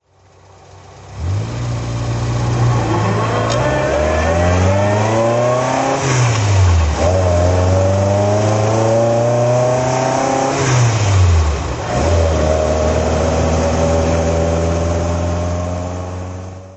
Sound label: ah sprite weber drive